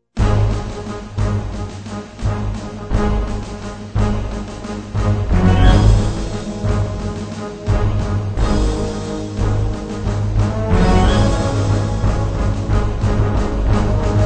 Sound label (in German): k2600 orchester demo